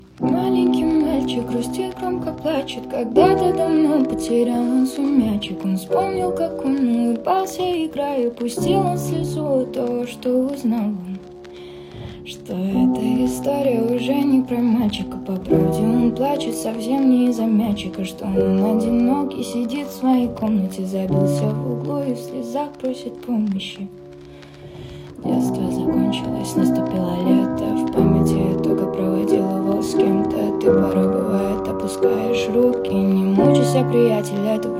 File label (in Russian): malenkij malchik grustit gromko plachet